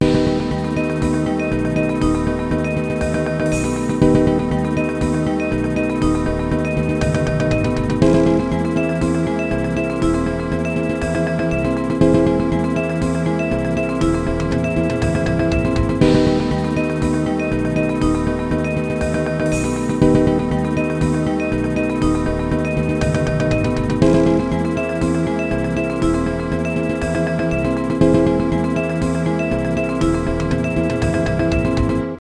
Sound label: jingle; Loop